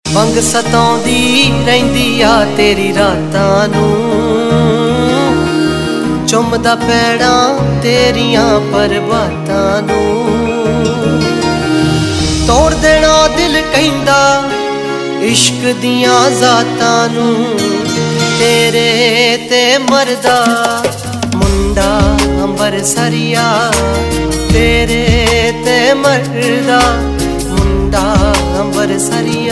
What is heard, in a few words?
Ringtone, Punjabi Pop